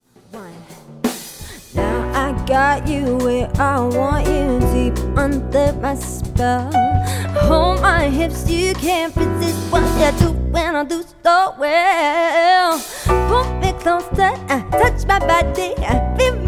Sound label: Hypnotik Jazz